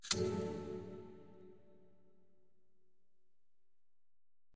spin Button Quick Stop; fairyhansel

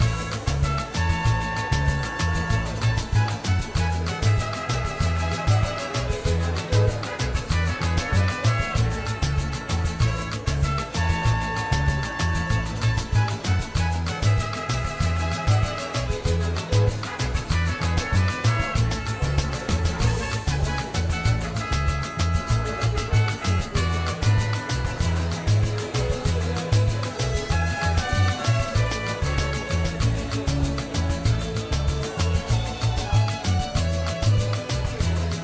ambience Free Spin; colossal pinatas

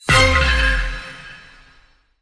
pot lamp; godsoffortune

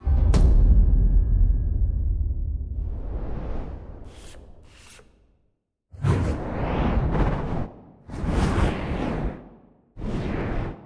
free spins intro text show; arcane

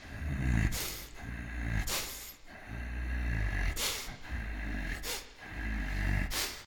breathing; the wolfs night

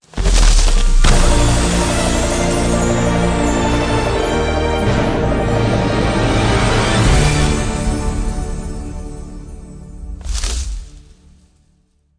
big Win; fairyhansel

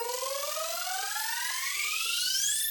ladder End Count Up; turn your fortune

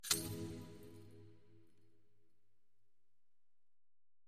spin Button Quick Stop; fairyhansel

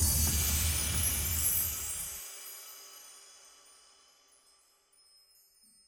outro Free Spin Click Out; wilderland

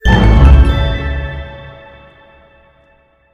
medium Win Tonal A; fairymirror

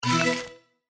deal, blackjack; blackjack3

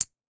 marker click; baccarat2

